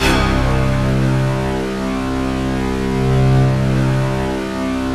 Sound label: BIG POWER, HEAVY SOFT; Sound Gallery - Charged